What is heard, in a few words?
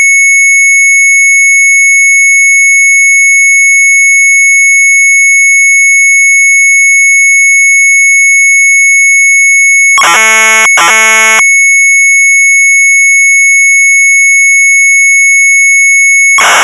MZ800 Tape, sharp; SHOOT; retromelsarchive